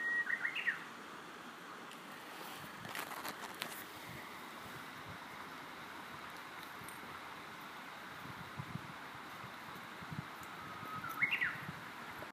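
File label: uguisu, Japanese bush warbler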